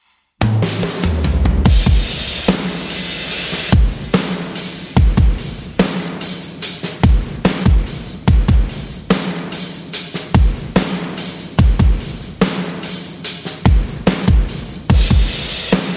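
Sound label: Percussion Only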